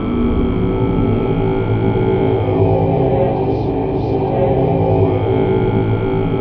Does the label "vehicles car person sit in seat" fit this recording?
No